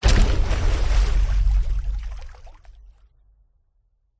water whoosh impacts movements; george gazetis

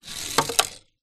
leisure toy car metal roll wood surface crash; hot wheels

